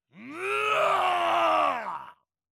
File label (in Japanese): ぬああああぁ！！！